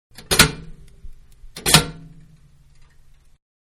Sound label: Toaster Up, Four Slice; Food (Sound Effects:Household); Toaster Four Slice-Up; Manhattan Production Music